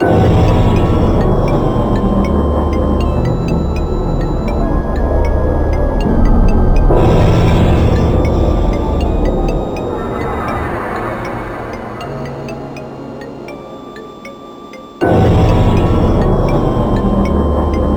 Haunted; The Simpsons Cartoon Studio

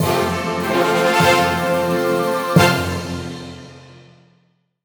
Big Celebrate; Puzzle Guardians

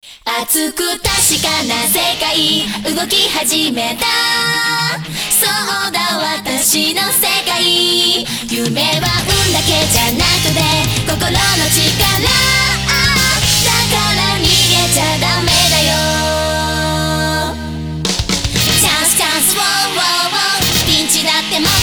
BGM Pop; Aikatsu 365 hi no Idol Days; 3ds gamerip

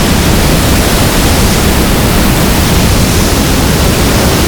Wave Crash

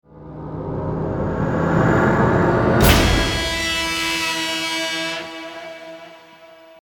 Cutscene Stinger; Infernal; xbox 360

